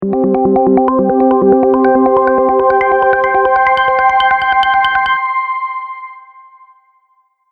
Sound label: Audio Logo Award, sonic logo; sound design